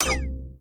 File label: Obstacle Tv On; In Obstacle Tv On